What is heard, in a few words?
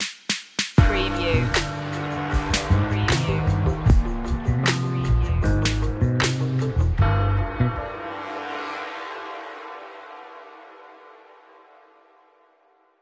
gloomy hip hop; 15secs